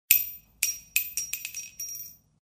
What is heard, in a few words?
Shell casings fall on concrete